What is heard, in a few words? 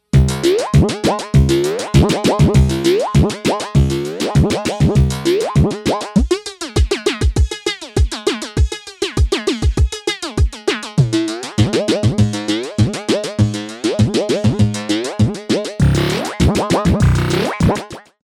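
envelope follower flange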